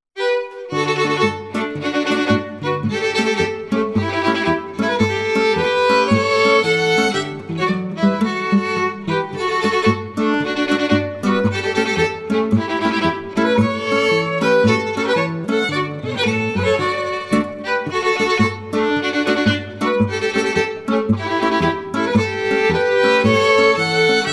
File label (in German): Tiroler Polka, Volksmusik